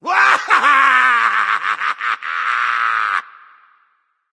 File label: battle cry; smite; voicepack; loki